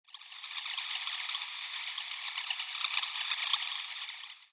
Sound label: Bat